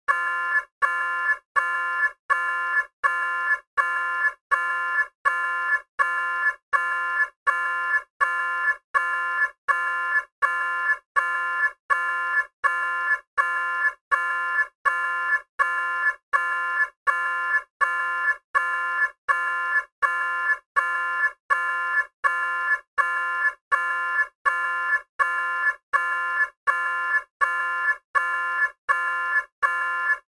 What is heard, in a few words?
Alarm Nuclear Meltd; alarms